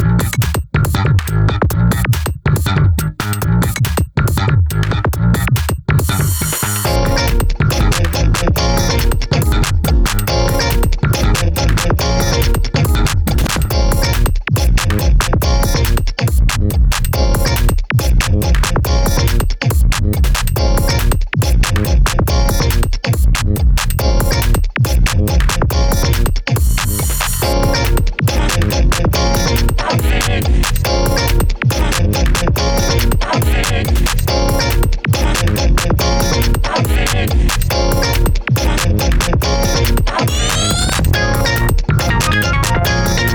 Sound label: Funky